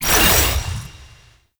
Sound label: Multinade Nail Grenade Throw; Warframe; Trapper